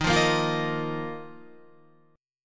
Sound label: Eb Suspended 2nd, Guitar; strummed; chord; 11,8,8,10,10,10; JGuitar